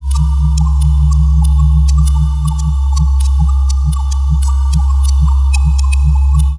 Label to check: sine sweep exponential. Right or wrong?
Wrong